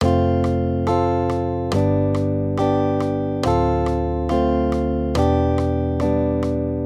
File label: maj triads